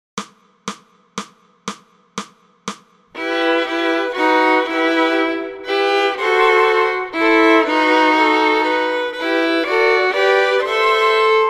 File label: Fiddle; Coventry Carol